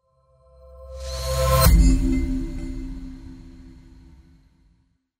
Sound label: Bell Bouncer